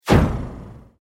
Sweep Mechanical Fast

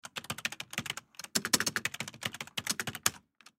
Pc Keyboard Type Sequence Fast Computer; Typing; Offices; Technology; Blastwave

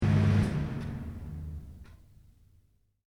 Fan (Extractor): Switched Off; Fans; Electronics; Fan Sound Effects

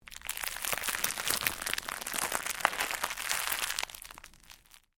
Cellophane crinkle

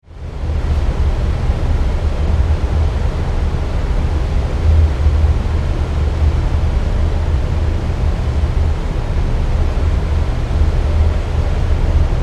Ship Large Ferry Deck Atmosphere under Sail; Tom Hutchings

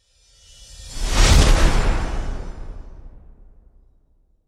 Hit Suction Slam, Reverb, Accent, Transition; Production Element, Imaging Element; Hit Sound Effects

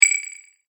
multimedia rollover, Multimedia Rollover Tone

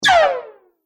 Multimedia Swipe, transition; Andrew Fleming

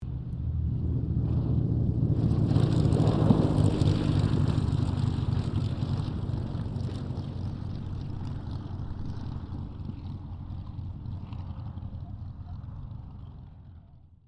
AIRCRAFT P-40F KITTYHAWK LAND; Warhawk/Tomahawk; Airplane; Vehicles; 1942

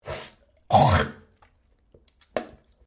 MALE SNIFF HACK SPIT; Male Vocal sounds; Sick (Sound Effects:Human)

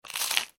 human eat crunch rye crispbread; Human Bite/Crunch Rye Crisp Bread; Eating; McKinney Sound

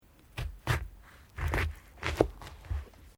ORANGE CUT; Various food preparation; Restaurants

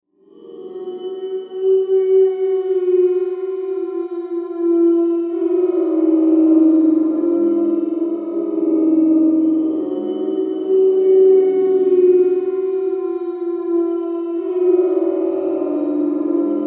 DRONE GHOSTLY, Drone Ghostly Cry Reverb; Scary Soundscape; Production Element Imaging Element Texture Bed Atmosphere Synth; Blastwave

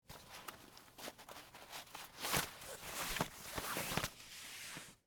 Karate Belt Untie; Sports; Miscellaneous Sports (Sound Effects:Sports)

